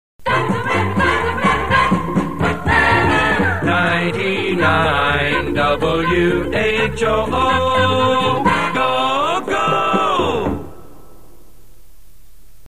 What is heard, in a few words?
jingle; 99 WHOO Go Go